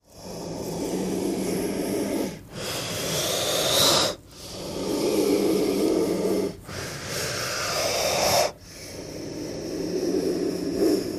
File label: Cobra Breaths Hisses